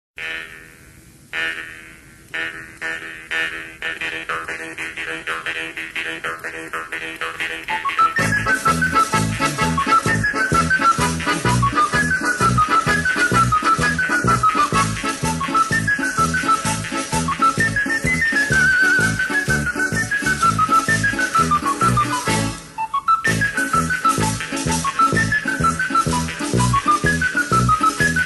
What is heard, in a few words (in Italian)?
tarantella siciliana